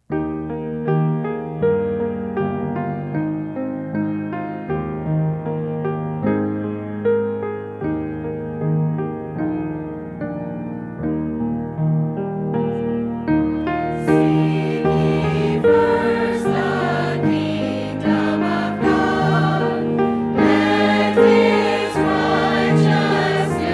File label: Choir; calvaryministries